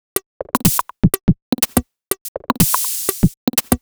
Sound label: Top 123bpm, House Drumloops